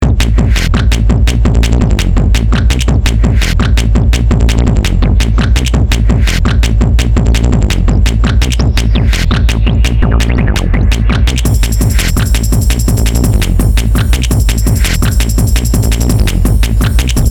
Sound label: Tank Beat